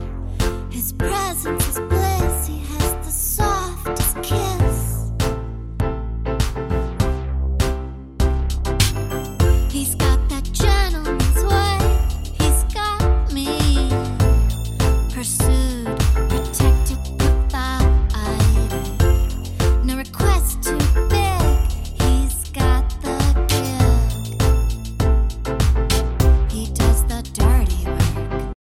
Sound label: Ringtone